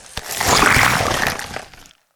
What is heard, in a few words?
Cactus Rager Attack